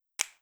Notification Snap; FPS; UI; FirstMicroGame

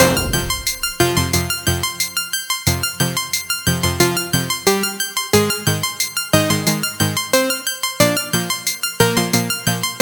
overworld music; Mario platformer

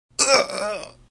game over

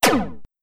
Hoverbot Attack; FPS; Enemies; FirstMicroGame

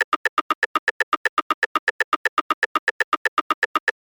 music

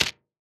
grenade; pygame

